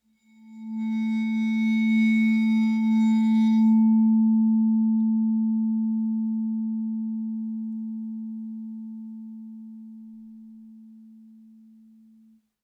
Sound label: Vibraphone bow A3 stereo; MIS Pitches - 2014; Percussion